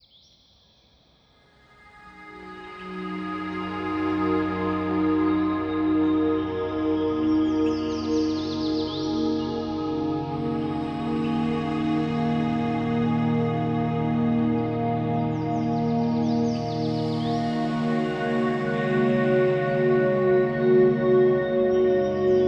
Binaural Beats Brain Waves Isochronic Tones Classical New Age Piano